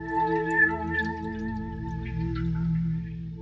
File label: SPACE PAD 140 A; Keys; SpaceSounds